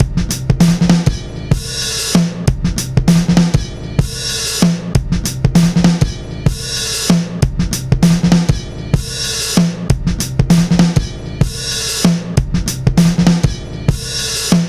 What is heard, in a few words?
97 bpm, Breaks; CATCH 22; 90 s Sample CDs; Planet of the Breaks